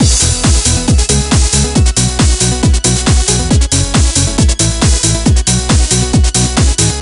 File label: Music Dance Intro; Littlest Pet Shop Garden Jungle Winter; NDS